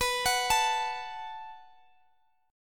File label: Gb Minor Double Flat 5th B, guitar